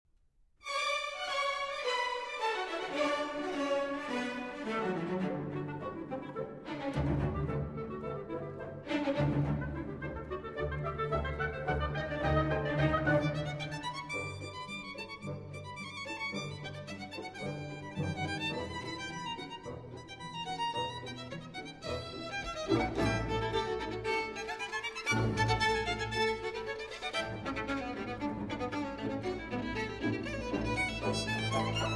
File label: Violin Concerto, Allegro Moderato; Op. 28